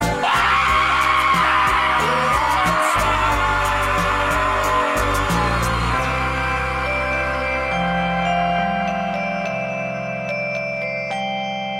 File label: scream longer